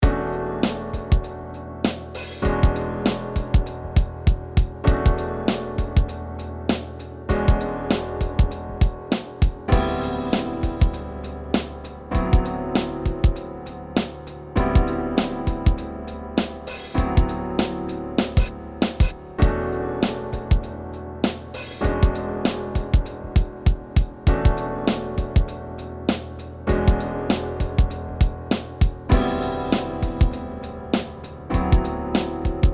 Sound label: Piano Puzzles